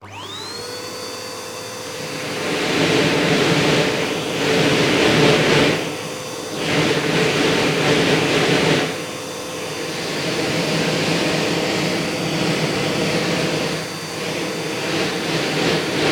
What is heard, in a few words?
household vacuum hover whirlwind on extension nozzle sucks close up off